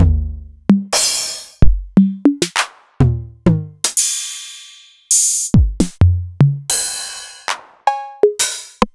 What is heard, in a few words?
Novation Drumstation